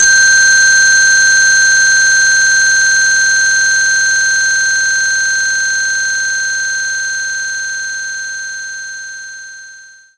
crystal G6

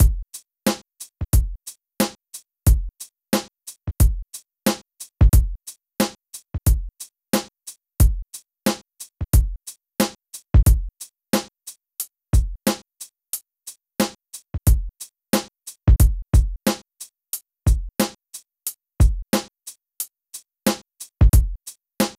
Rap Battle Drums

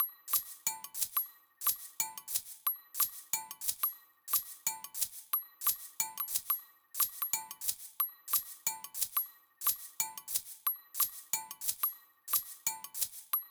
perc; Toki Tori 2+; Toki Tori Collection

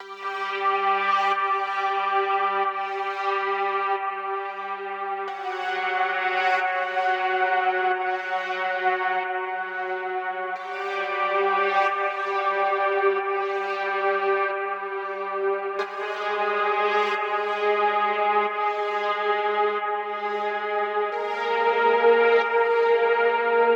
Synthwave 91bpm Music Menu; Hot Lava

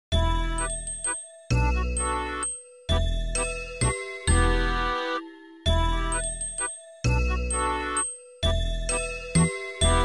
Sea Shanty Xmas

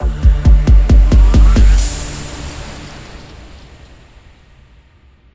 Transition; everspace 2

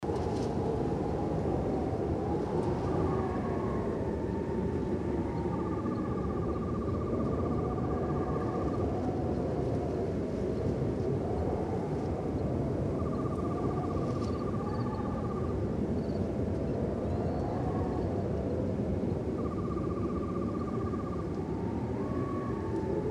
desert Wind; Tatooine; Star Wars Battlefront

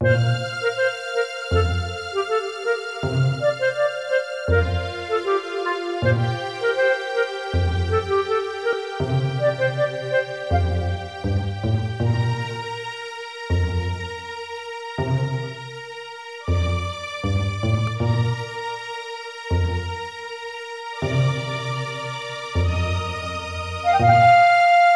Filmset; Discworld II Missing Presumed; MS-DOS

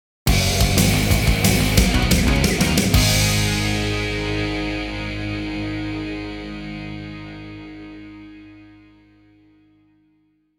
Pounding Pavement (Goal)

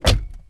closing car door inside

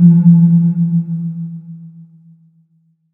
Buzz Red Tail; Glow Artisan; DSiWare; nds

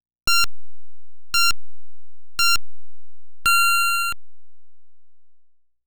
Start Signal

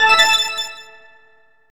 Silver Coin; Diddy Kong Racing; Nintendo 64

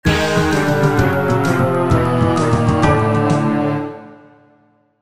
failure; Temple Trekking

